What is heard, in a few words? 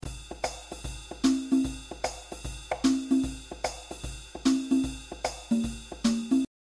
Swing